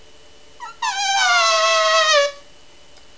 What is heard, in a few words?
elephant; They make a trumpet sound; zoo